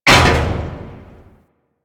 Mech Head Impact; Nechro

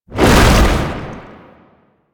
Tank Infested Swipe Whoosh; Enemies; Abilities; Warframe; Lasrian

